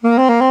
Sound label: TRIL LO A#-C, SOPRNO